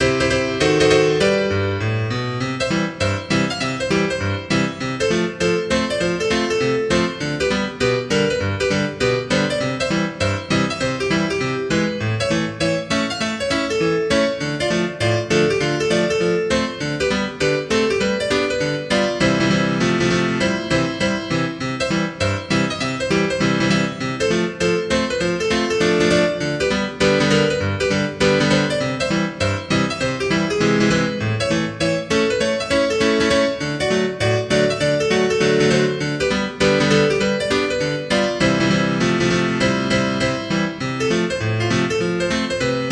The syncopated rag

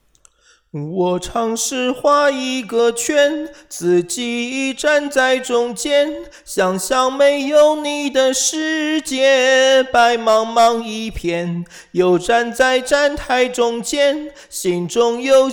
Singing unaccompanied

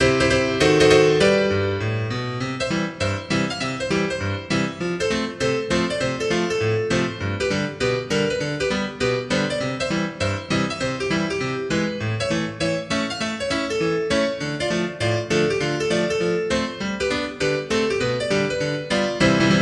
The syncopated rag

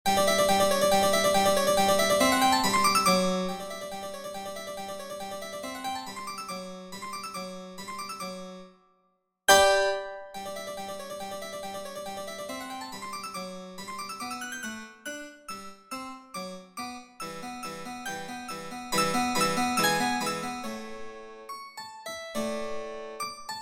piano sonata

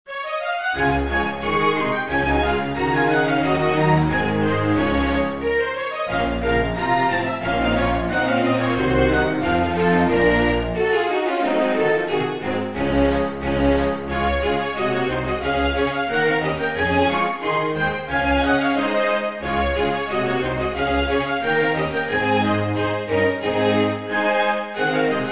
Gavotte in Db

